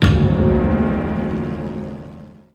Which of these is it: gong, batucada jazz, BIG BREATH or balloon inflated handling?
gong